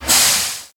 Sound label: Steam Release Sound; Learn to Fly 3